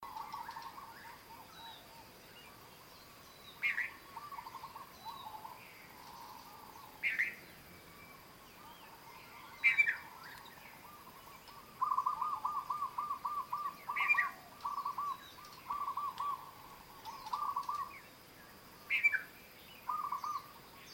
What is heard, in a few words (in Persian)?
sedaye bolbol